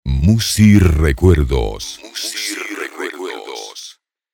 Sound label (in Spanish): BAJO PISADOR; MUSIRECUERDOS